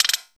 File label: PERC L, Drum & Bass 170 BPM; Ueberschall; Drum & Bass Flash Trackz